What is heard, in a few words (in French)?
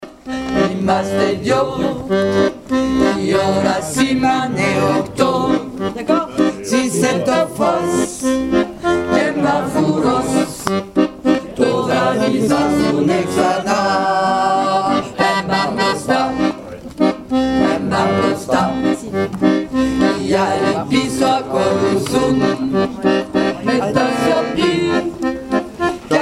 basse, Chansons